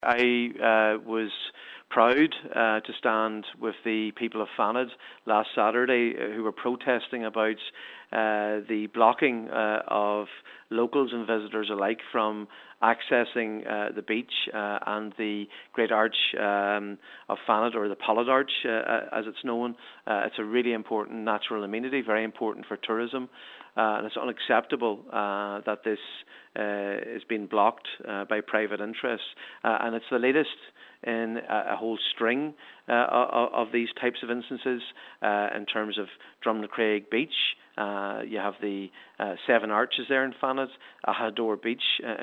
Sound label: pad